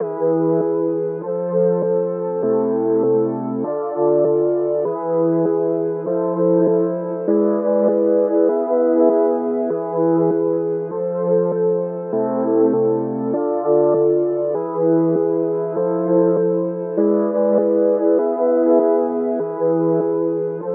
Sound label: 99 BPM A Min Pad; Melody; Loop Stems; Cymatics; Infinity Melody Collection (Preview); Emotions